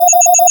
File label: CALCULTE, Anime Sound FX, SIGNALS; AKAI Anime Sound FX